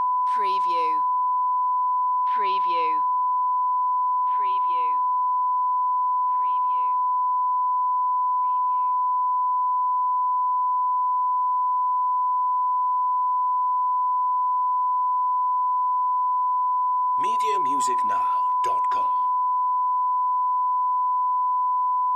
Test Tone 1 KHz

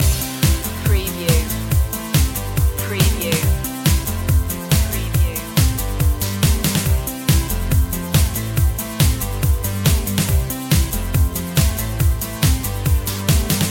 singing robots loop

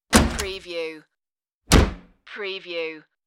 door open and slam

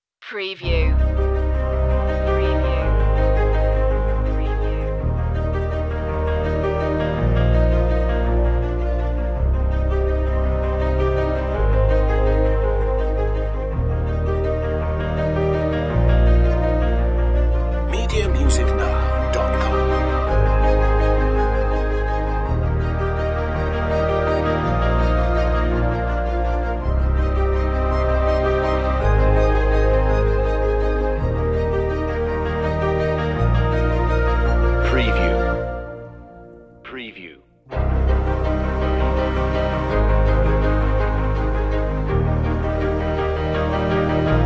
waves of life no choir or perc

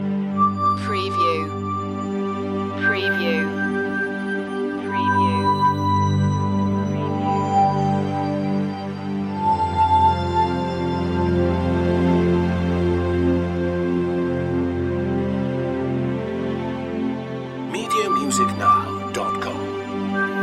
perfect bliss loop

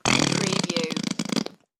Slow Fart

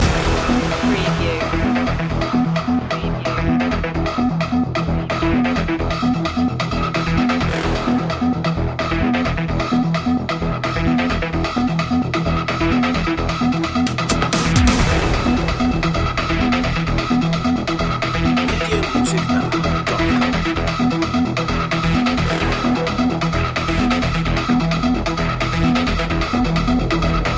tailgate loop

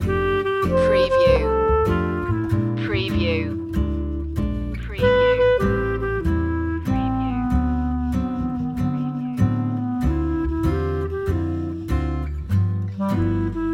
loop

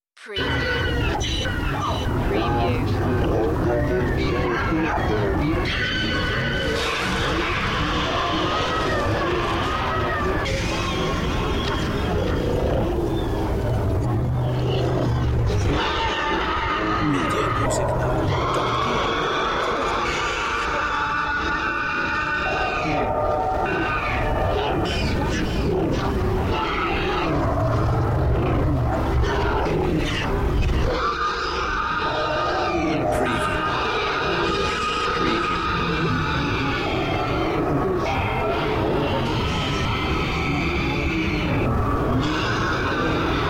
hellfire screams ambience

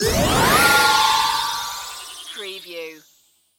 Magical Ascend Metallic Flutter

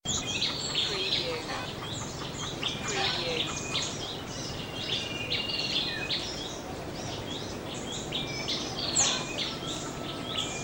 Outdoor Daytime Ambience

